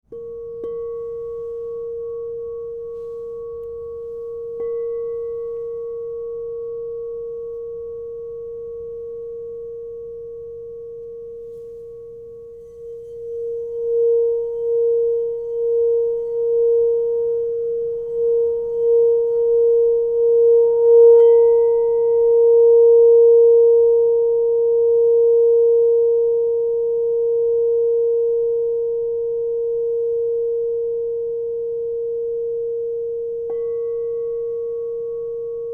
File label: Crystal Tones Singing Bowls